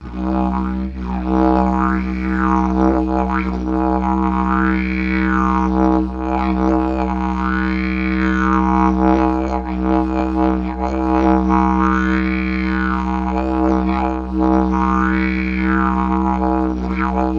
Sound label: Digiridoo